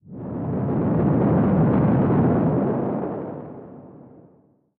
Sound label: rocket Oneshot